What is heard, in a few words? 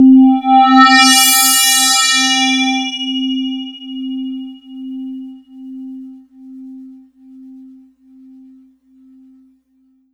Casio VZ 10M Bright Phaze C4